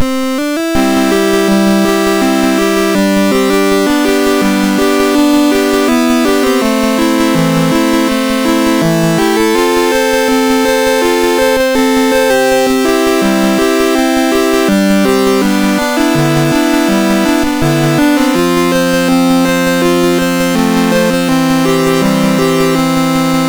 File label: MOS6581R3, sid; Quiz-Master v3 8